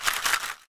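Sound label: SHARK CALL, ethnic percussion; WorldSounds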